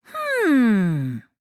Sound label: "hmm"; monokumav3